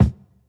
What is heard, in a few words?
Kick